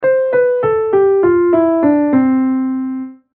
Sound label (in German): C-dur down